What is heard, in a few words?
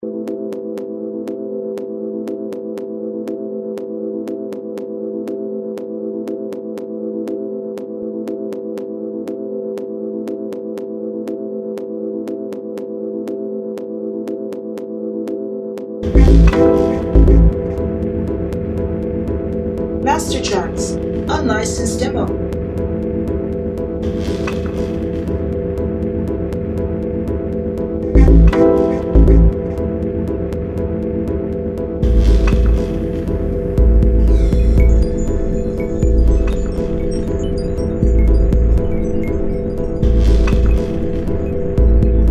crawling noise 120bpm